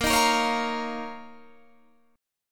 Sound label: Eb 7th Suspended 2nd Bb